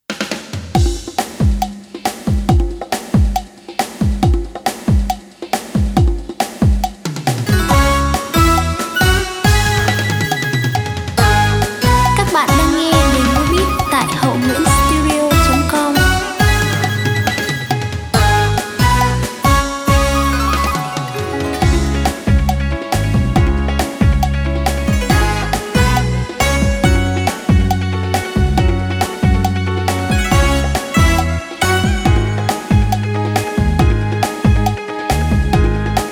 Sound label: beat, playback